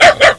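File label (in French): chiot, puppy; MOBICLIC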